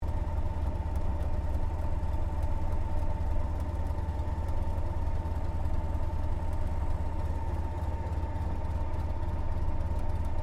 Aircraft P-40 Kittyhawk taxi Interior, WW2 Kittyhawk or Warhawk fighter plane; 1940s; Airplanes